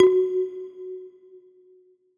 Marimba; Synthetic's Serum Collection; ESW Sphere

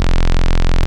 Square Saw; Wavetables; Synthetic's Serum Collection; ESW Core Wavetables; Echo Sound Works Core Tables

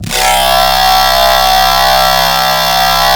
Grinder, Noise; Cymatics; Shapeshift

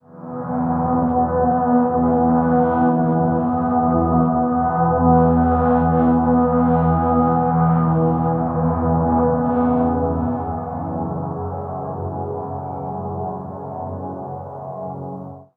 Horns Of Fear; Synthetic's Serum Collection

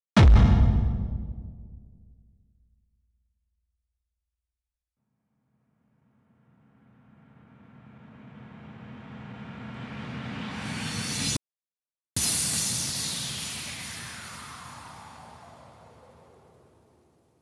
Free Sampling Rhythm One Shot; J-CORE

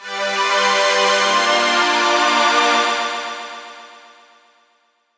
pretty pad synth line; 'Effection' samples; lolicore

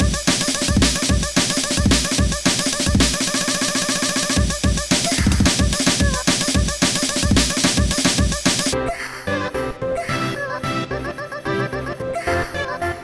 broken quartet; lolicore

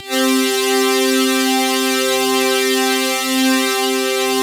Jackpot, Noise; Cymatics; Shapeshift

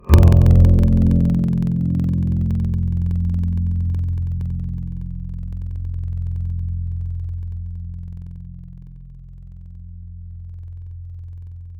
Dulcimer; Synthetic's Serum Collection; Echo Sound Works Sphere

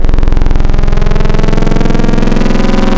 FM - Saw FM8; Wavetables; Synthetic's Serum Collection; ESW Core Wavetables; Echo Sound Works Core Tables

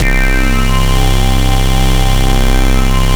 Noise Osc; Funkya; Cymatics; Shapeshift Noise Osc Samples for Serum

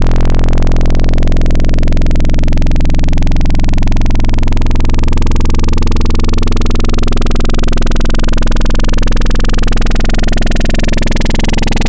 Fm Shifter, Serum Wavetables; Synthetic's Serum Collection; Cymatics - Nuclear for Xfer Serum Wavetables

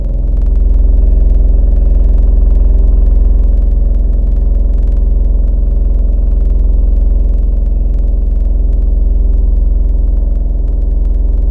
String Violins; Instruments; Synthetic's Serum Collection; Echo Sound Works Core Tables

